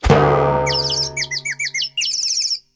head bonk birdies; Crash Bang Boom

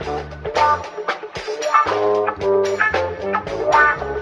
guitar, Loops, Phonky

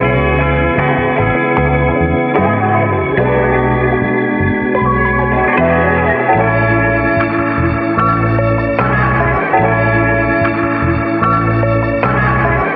guitar and keys, Loops, Phonky

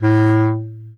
bass clarinet; Notes; Winds

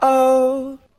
"oh" sung

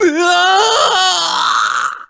scream japanese; ooh ahh

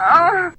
"oh" orgasm; ooh ahh

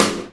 snare, Real; Snares

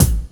Kicks Loud

